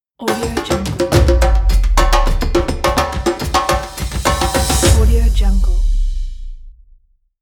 percussion logo

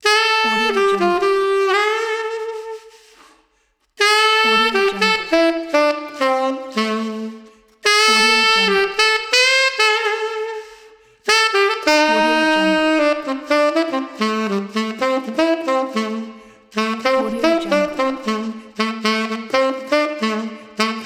tenor saxophone solo

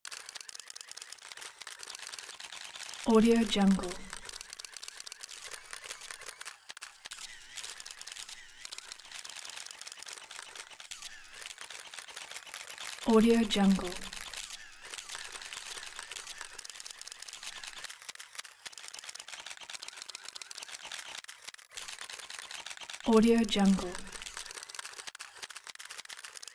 int camera press conference; collanderson

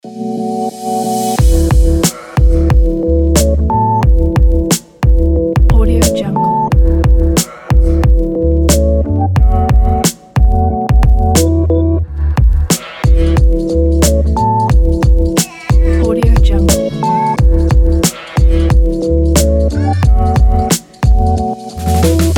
chill background hip hop

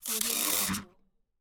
game futuristic electricity discharge sound

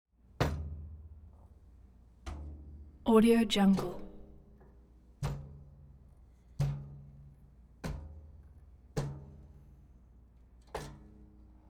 drum set drum skin hand pats multiple hits

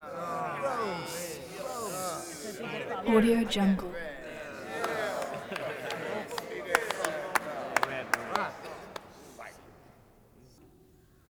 prison crowd complain aw man unhappy claps; prosoundfx

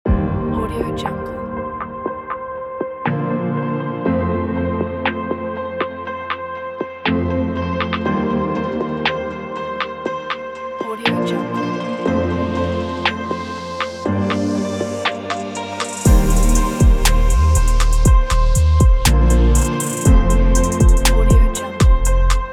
travel chill trap

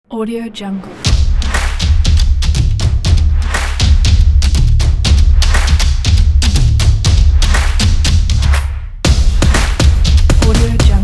the drumming

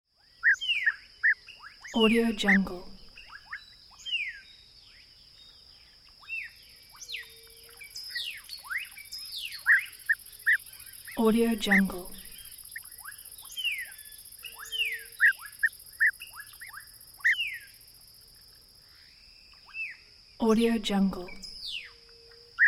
forest and birds

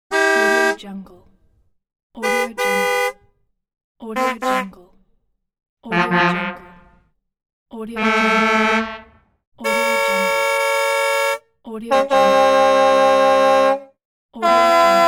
car horn

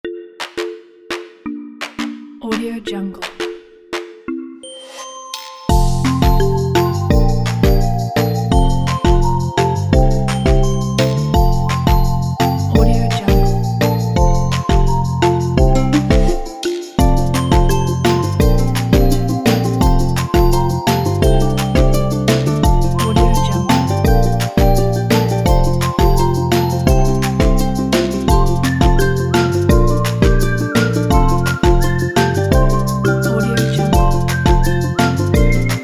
tropical motivation

